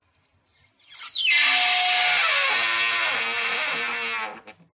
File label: Wood Stress Creak Squeaks; DPA4060; PMSFX